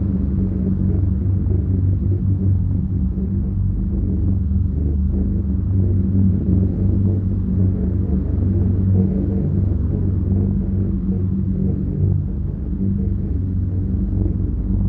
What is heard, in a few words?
think tank structure amb; Stellaris; utopia